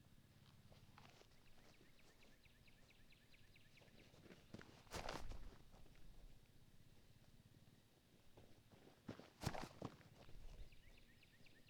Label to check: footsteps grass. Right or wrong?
Right